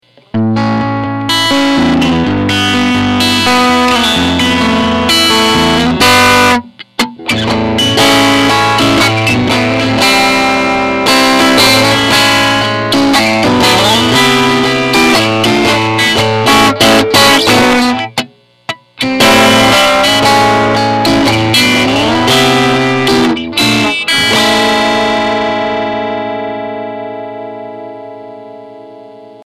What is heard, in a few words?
treble 15 gain 75 jazzy; pale horse transparent overdrive; warmth dev